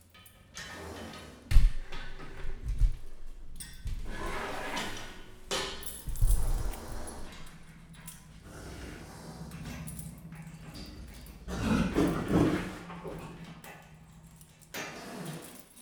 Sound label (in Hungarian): zsilip kinyitása, leopárd; jaszberenyi zoo 2015